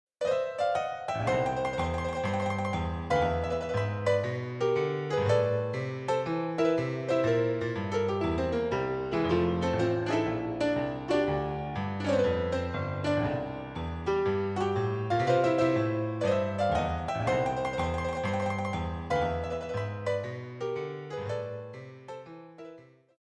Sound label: Ragtime; Mother 3 remastered; Osohe